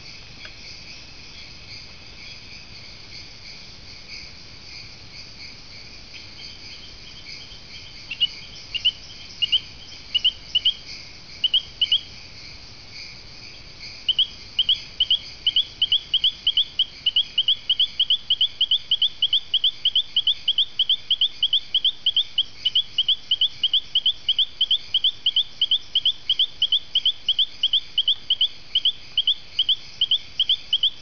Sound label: Allobates hodli